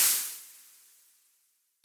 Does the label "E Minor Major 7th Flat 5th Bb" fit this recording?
No